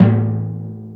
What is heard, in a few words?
TIMPANI; Big Fish Audio; Synth City